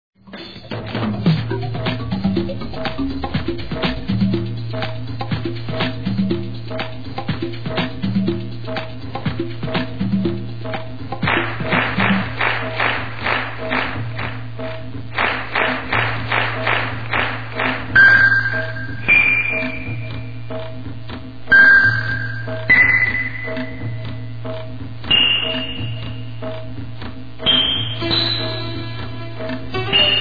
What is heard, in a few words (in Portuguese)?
ritmo